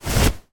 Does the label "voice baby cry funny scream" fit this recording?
No